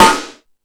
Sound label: Ambient Rock, E-MU Pro-Cussion